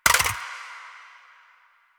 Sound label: Pistol Reload Mag Drop; Weapons; DE; Warframe; Tenno; Lasrian